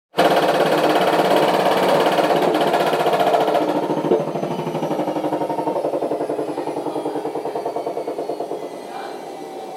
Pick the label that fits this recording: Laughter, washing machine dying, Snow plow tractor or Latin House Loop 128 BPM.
washing machine dying